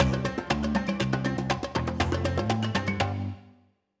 Spin jingle

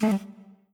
button stop; netent

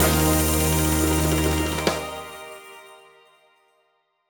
small win end; netent

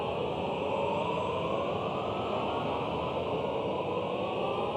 shepard

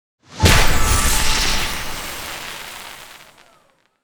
medium Win Delay; netent

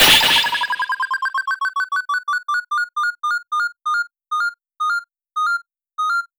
Splash Wheel Slowdown; netent

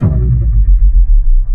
pre big win; netent

